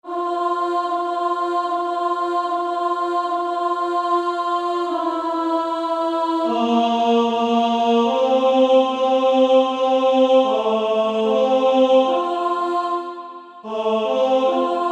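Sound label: Female Choir, alto; Onward Christian Soldiers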